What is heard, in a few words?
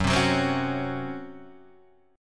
C sharp Major 7th Suspended 4th F sharp